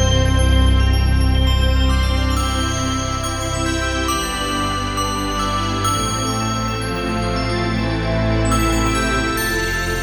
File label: Home Forest, fantasy